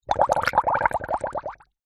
food drink bubbles blown through straw into water in plastic cup; zapsplat